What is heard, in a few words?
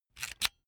electric drill template slide in medium; maschinen; bohrer; soundpool